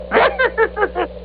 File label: yoda laugh